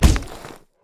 yeti vs stone; nunu

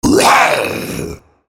spell cast effort, en US; Rengar

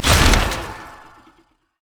seed step on; Zyra

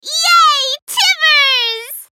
ultimate effort; Annie

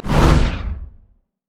wall of pain; lich; karthus

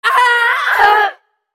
dying; Zyra; en US

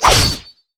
crit attack; masteryi base

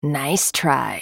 spell cast effort, en US; Sivir

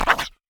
wolfman grunt; LoL; monsters

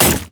basic attack ohm; renekton

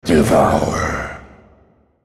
spellcast effort; Yorick; en US

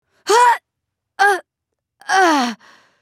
dying; Quinn

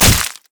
spider basic attack; Elise

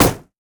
blue minion mech basic attack; LoL

